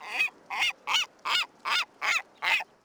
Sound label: Seagull; Project Gotham Racing 2